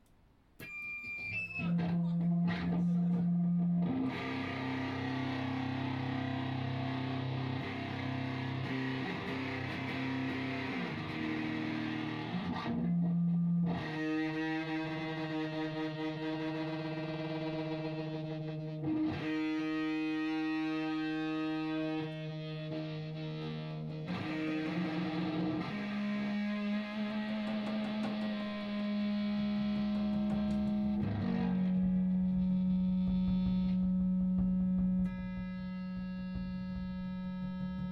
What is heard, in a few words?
noise